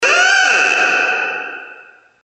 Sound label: door